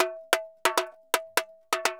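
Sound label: TAMBORIN, salsa drums and percussion; Best Service ProSamples vol.14; AKAI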